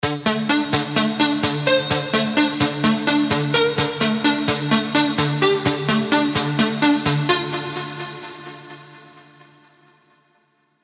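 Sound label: PROG ARPS D minor 128 BPM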